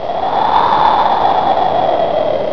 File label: wind loop